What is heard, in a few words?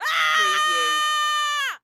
Scream Long Female; Sound Effects Bible